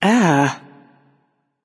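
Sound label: ugh, female; smite; voicepack; hera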